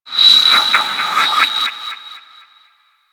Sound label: Bark; Warframe; Teralyst; Vomvalyst